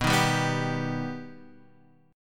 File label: B Augmented B, guitar; B+ Chord {X 2 1 0 0 3} strummed